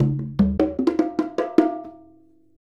Bongo Fill; Essential Percussion

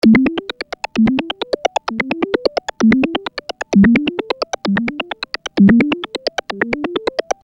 Arpeggio 130 E, Techno Synth Loops; Sonic Foundry (Sony Creative Software); Signals I

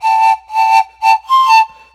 Thai Pan Pipes; Ambient; Clean Flutes

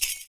FOOT PERC; Univers Sons - Raricussions

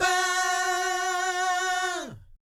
VIB FX, Vocal Planet Jazz & FX; ILIO; JAZZCOMBO

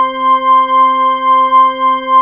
GLASS ORGAN, SYNTH

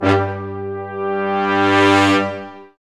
BRS, Lrg Ensemble; Roland L-CD702

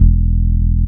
DR. LOOP, BASS; X-Static Goldmine 3

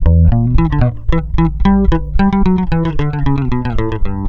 bass, Roots Funk; USB Soundscan vol.41; AKAI

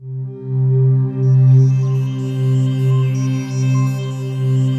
WS NL C 3, Korg Wavestation; Back In Time Records; Korg Universe VOL-1

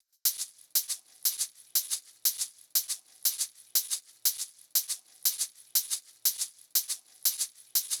Hh Sh Loop 120; Equipped Music; Loungin House

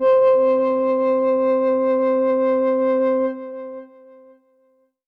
Synth C, Synth Prophet One Shots; Electronisounds

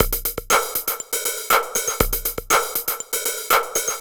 Percussive

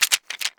RATATAK, African perc; Greytsounds VOL-1

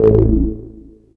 dance, bass

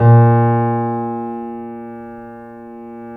C7; East West; The Ultimate Piano Collection

